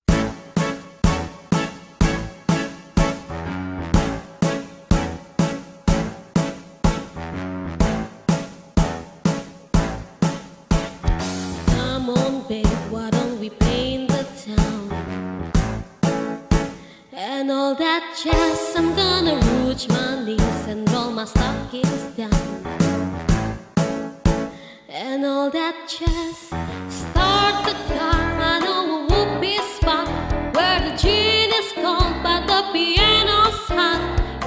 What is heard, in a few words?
musical; chicago